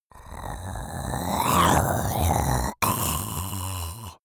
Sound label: monster choking zombie idle; comedy-cartoon; soundpool